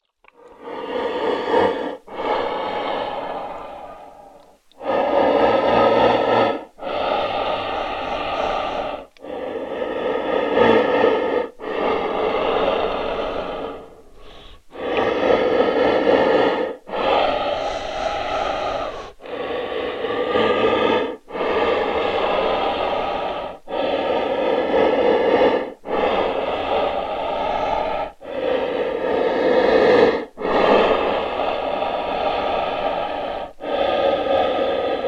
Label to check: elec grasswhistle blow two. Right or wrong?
Wrong